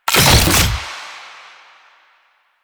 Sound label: Fuel Projectile Pick Up; JadeShadows; Ascension